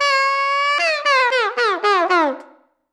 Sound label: Backslapper; The Act; Arcade, iOS, MacOS, Mobile